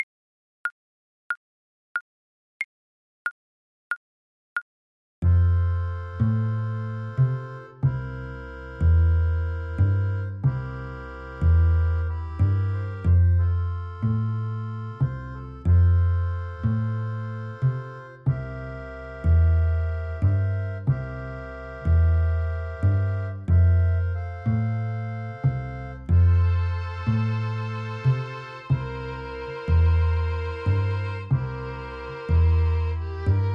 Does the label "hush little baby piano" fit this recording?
Yes